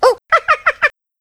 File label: hunter pounce; catnap n grabpack; elmo